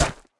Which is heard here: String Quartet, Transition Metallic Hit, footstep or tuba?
footstep